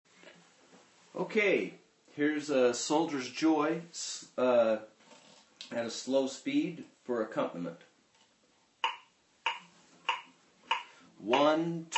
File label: Backing Track Slow, fiddle; Soldiers Joy